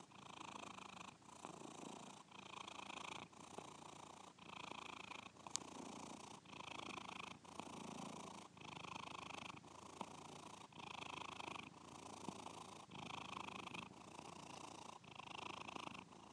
snoring kitten

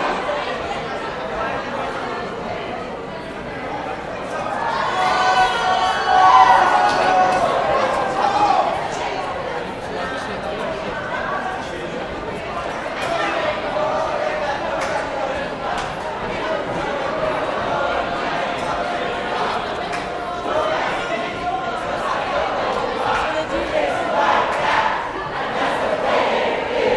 silent disco

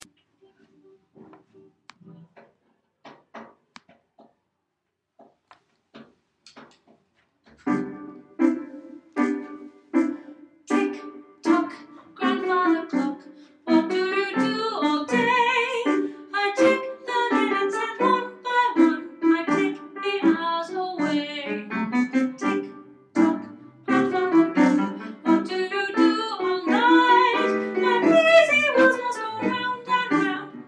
grandfather clock guide track

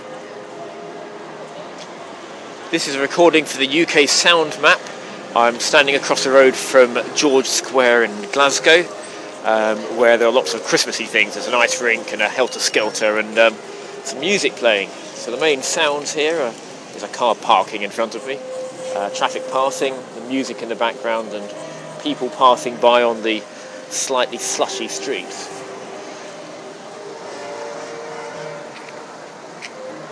george square glasgow

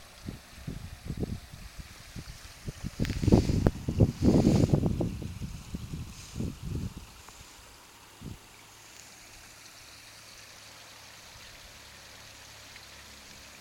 sounds from marsden below

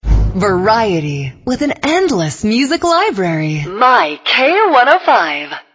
alt country

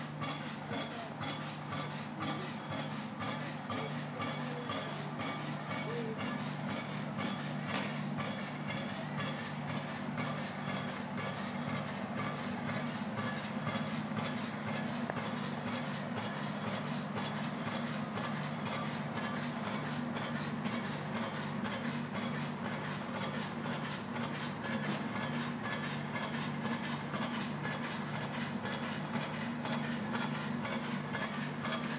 60 seconds of rhythmic building machinery

